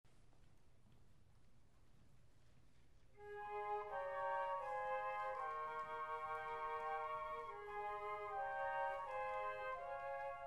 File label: Flute Choir, Orchestra; All University Orchestra; Hymn from Finlandia